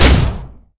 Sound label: blast fire